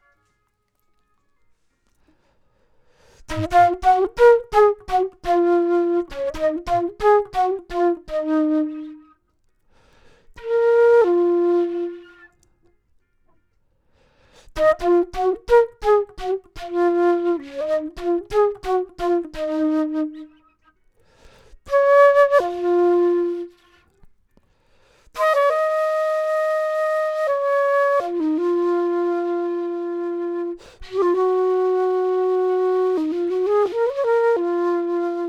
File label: flute; logic